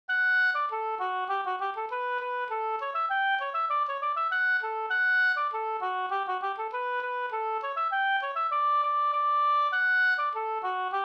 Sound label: polka